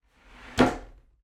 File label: household table dining extending section slide together